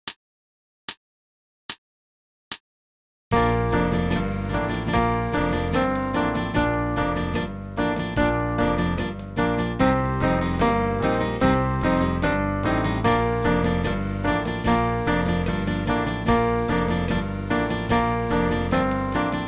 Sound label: 72 bpm